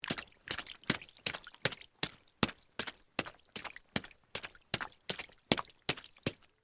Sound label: Step Water Splash, Footsteps, Water, Splash, Fast; BlastWave